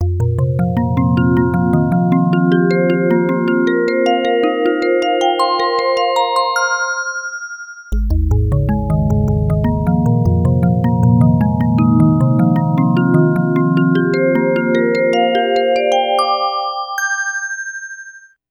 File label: FM Test